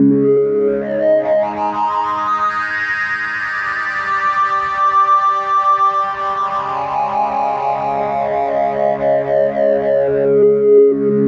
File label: climbing distortion, guitars